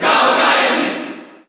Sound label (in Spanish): Grito del público (Japones); Incineroar; SSBU